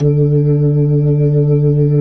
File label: B-3 D 3, Jazz; Keyboards of The 60's and 70's